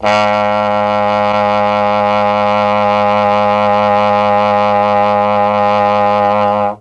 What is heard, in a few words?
G#1 TROMBONE, Mellotron; CHMB TRMBONE; Mike Pinder - Mellotron Archive CD